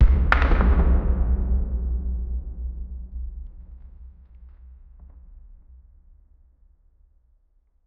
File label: a sequence of five extrem, crate